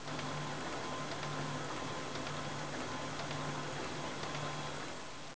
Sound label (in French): Machines Son